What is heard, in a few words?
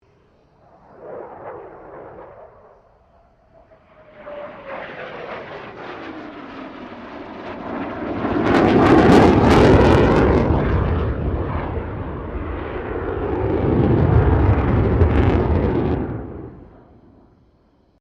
F16 Falcon pass; Airplanes; Vehicles